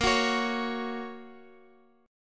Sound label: A sharp Minor Sharp 5th, down, Guitar, Open G